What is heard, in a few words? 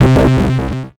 Multimedia Internet CD-Rom Flash Animation